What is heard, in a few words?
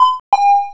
jingle